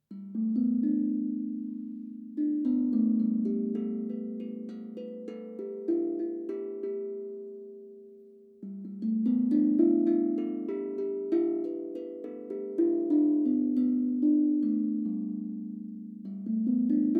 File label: Tank Drum G Minor